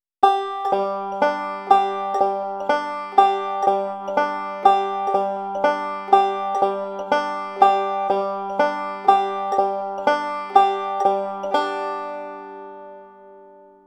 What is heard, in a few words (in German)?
forward roll synkopen